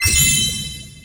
symbol hit jackpot

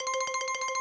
bigwin coin count loop

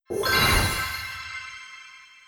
symbol hold

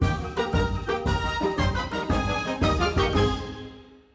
slot intro

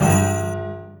symbol hit scatter

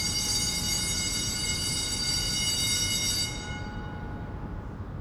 symbol win retrigger

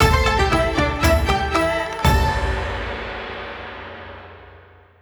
popup freespin start

